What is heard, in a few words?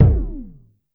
syn drum; Masterbits - Total Zone